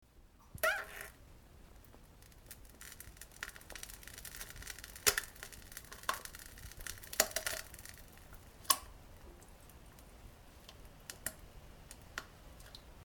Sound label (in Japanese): 普通うんこ ほそいおなら ぷぅ むちむちぽちゃ音